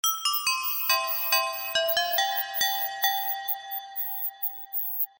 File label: ice cream sms tone; tin tin